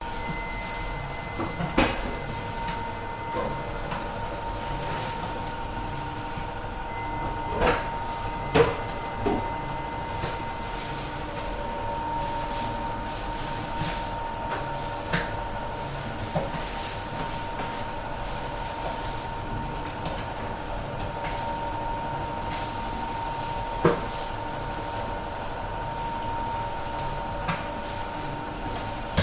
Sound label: German Bakery Doug Mixer Running And Buzzing Beeping in Background; your free sounds